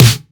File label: crisp snare one shot a sharp key; snare drum samples